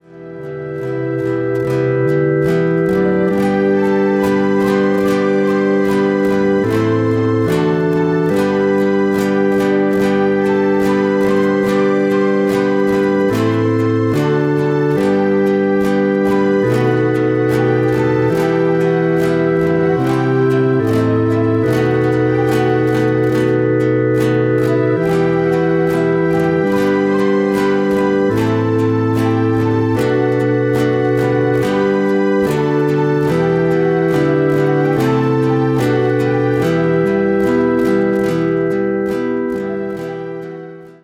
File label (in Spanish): Divino Mesas Guitarra